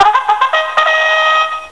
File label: Snap Horn; System 7 Snap Mail Package